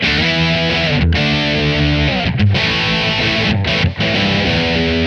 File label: E4 95 chords